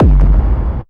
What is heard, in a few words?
hardcore 1, bassdrum